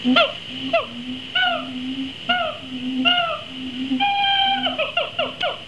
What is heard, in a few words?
siamang